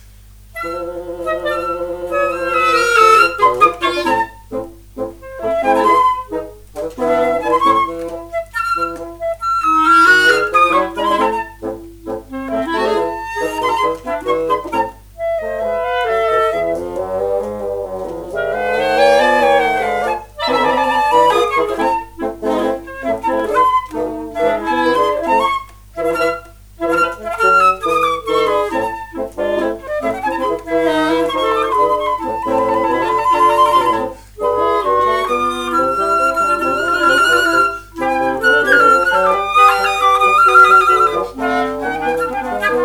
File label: tango fur elise; Beethoven-Leenhouts; Fl, Cl, 2Bsn